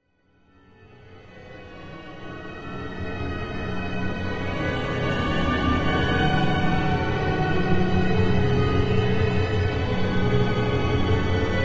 Music Eerie Slow, orchestral

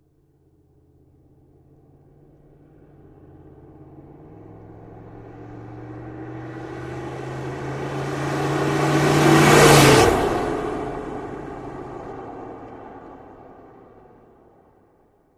Humvee Fast By

